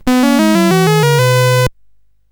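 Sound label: Number Tumbler, MOS 8580 SID chip music, games; CSG8580R5; hvsc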